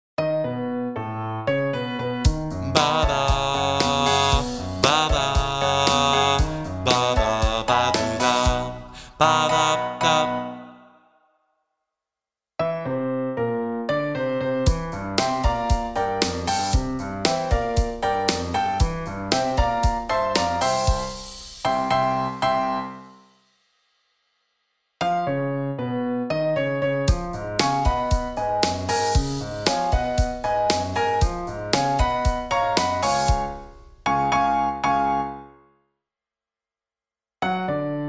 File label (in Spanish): ALTO, CALENTAMIENTO, SHOW CHOIR